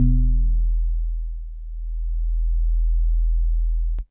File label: House Organ DX100 E1